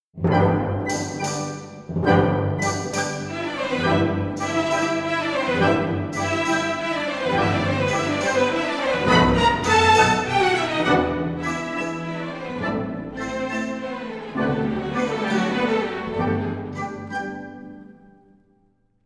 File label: Turns, Aragonaise; Le Cid